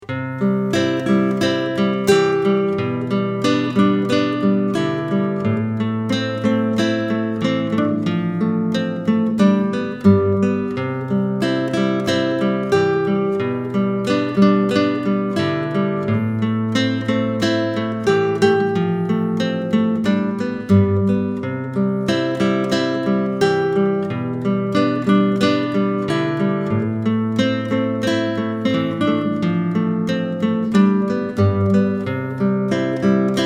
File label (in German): Popsong-Git.-Duo; leicht